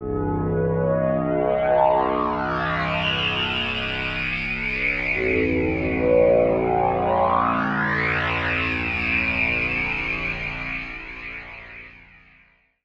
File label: Pad; Dubstep